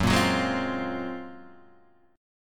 F sharp Major Sharp 11th F sharp Guitar Half Step Down